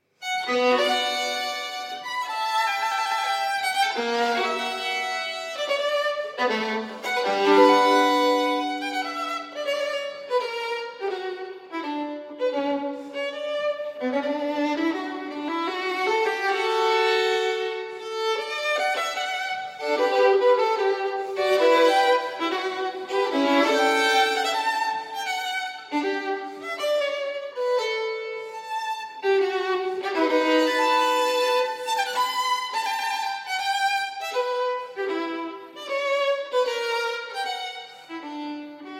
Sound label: Violin Partita, B Minor, Allemanda; BWV 1002 I.